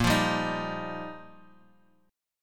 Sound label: G Minor 7th Sharp 5th